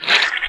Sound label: squirt